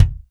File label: Real Drum Kit, ROCK KIT